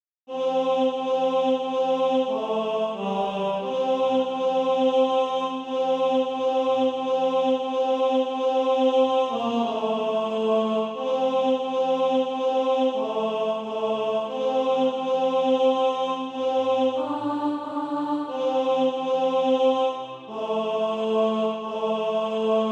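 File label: tenor, Practise Music; SATB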